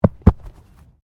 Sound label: Body movement Tumble Medium